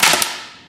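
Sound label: physics clipboard impact medium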